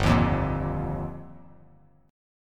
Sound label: G Minor 7th Sharp 5th; Gm7#5; strummed; JGuitar; 3,6,3,3